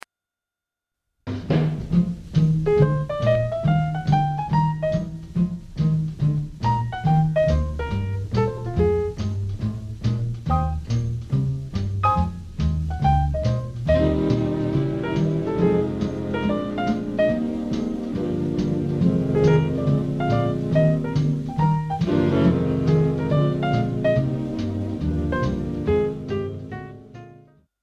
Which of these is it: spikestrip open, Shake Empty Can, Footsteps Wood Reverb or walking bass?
walking bass